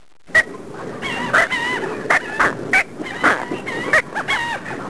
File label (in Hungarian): pelikan